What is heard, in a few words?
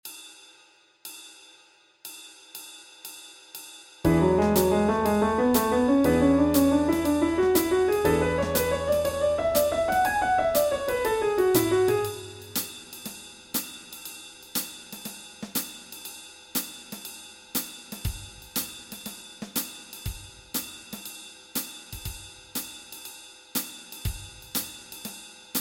Backing Track, walking bass